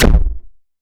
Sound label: alien death